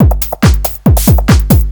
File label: TECHNO BEATS 140